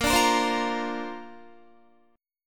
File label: Bb Major Add 9th, Guitar; strummed; JGuitar